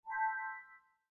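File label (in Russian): звук windows 7 - критический разряд батареи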